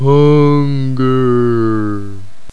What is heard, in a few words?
hunger, zombie; brainbread